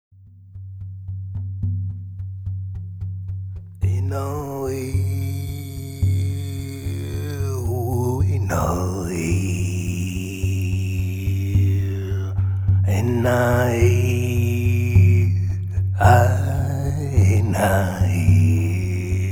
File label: Drum